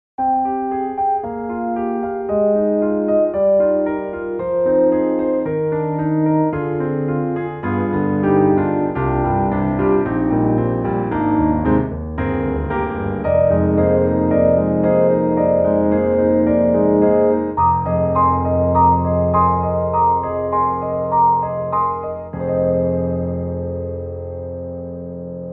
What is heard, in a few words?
CFX CONCERT GRAND, Dull Resonance; GARRITAN; Classic